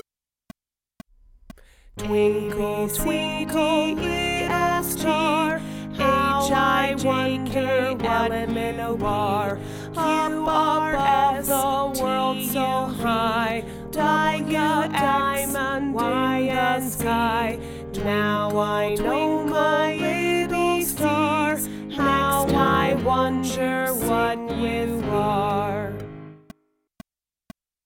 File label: twinkle abc